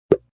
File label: Knock; Knocks